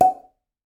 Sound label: bottle popping